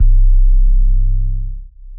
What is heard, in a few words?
Boom; 3 OP Boom; Booms; Ableton; Beat Tools